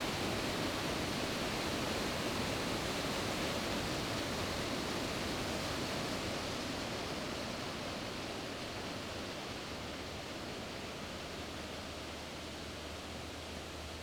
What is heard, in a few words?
Shingle Creek Falls; Webber Park